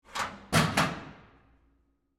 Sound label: Locker Close, Sports, Locker, Door, Close; Miscellaneous Sports; BlastWave